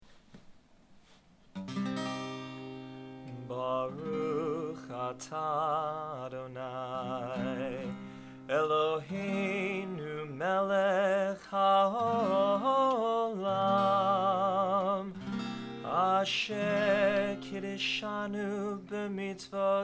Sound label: Candle Lighting